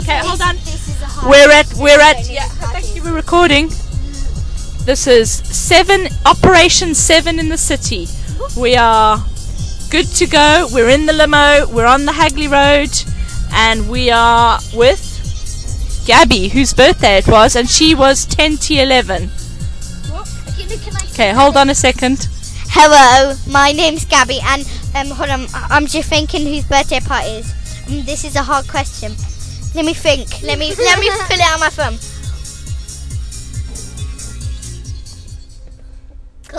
brass group start proceedings at the carol service